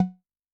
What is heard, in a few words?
Conga, Yamaha DTXpress-II Perc